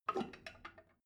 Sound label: drain valve close regular, badezimmer; haus garten